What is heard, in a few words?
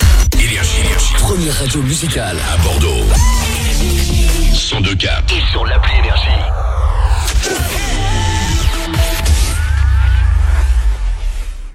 JINGLE